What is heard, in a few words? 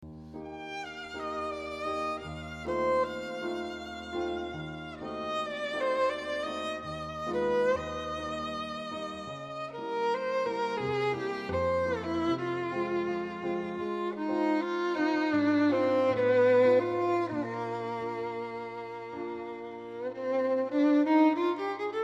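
violin piano, melodie; tchaikowsky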